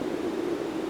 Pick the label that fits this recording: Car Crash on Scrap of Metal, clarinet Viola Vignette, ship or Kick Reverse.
ship